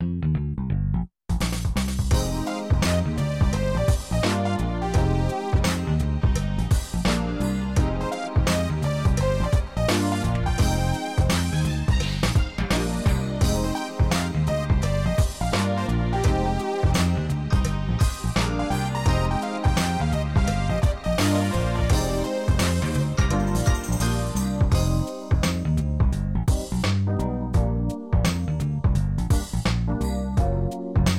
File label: Dance